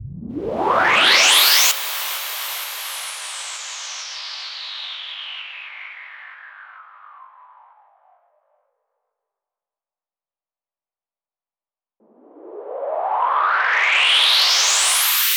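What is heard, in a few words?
FX Noise Mix, 140 BPM; Vengeance Samples; Song Kits; Take Me Away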